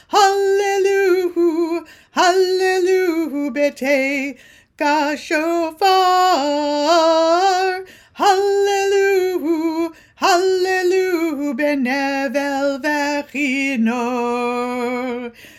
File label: Psalm 150 Verse 2; Kulanu Joint Saturday Morning Service; Shabbat